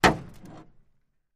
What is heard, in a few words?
Metal Impacts Light, pipes